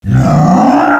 effort; tf; bowser

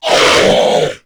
attack; tera creatures; abomination guard

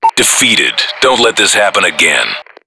lose; payday

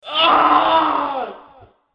scream

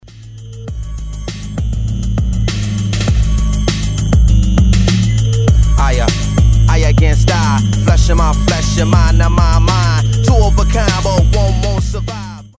riff

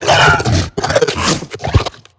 minion run chatter; tf; slender; attack of the radioactive thing

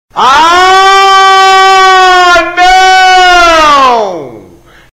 aahhnao

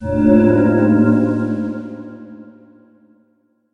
mystery bell

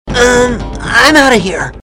lose; spyro

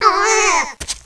heavy draw; weapons; lightning hz

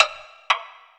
time travel active

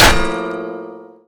chargebow, weapons

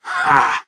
effort; tf

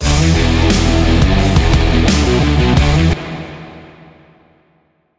guitar; axel nosferatu

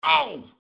hurt

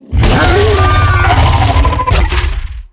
queen charge start; Attack of the Radioactive Thing

